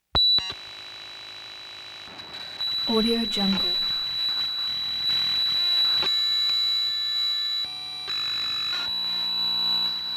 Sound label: beeps squawks static sporadic buzzy bursts; prosoundfx